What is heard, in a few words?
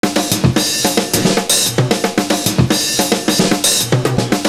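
FILL LOOP, 112 bpm; Univers Sons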